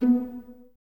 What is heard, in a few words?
Violas B 3 Pizz; Miroslav Vitous; String Ensembles